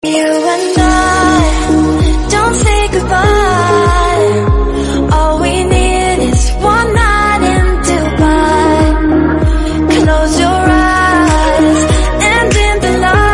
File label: English Song Ringtone